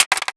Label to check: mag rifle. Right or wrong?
Right